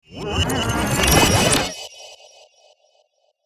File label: Time travel reverse whoosh